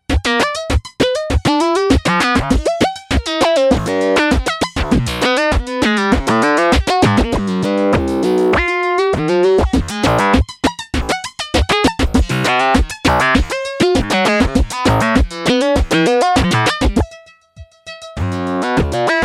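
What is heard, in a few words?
envelope follower flange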